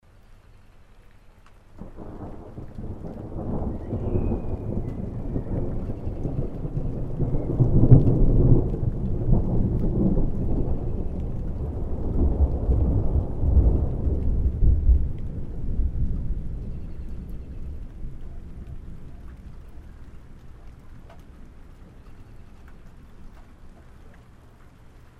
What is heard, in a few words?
Thunder; Flute